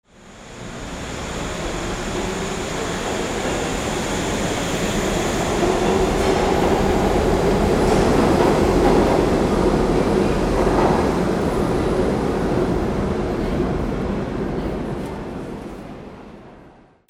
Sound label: VEHICLES